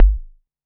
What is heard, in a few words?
percussion; VES1 Percussion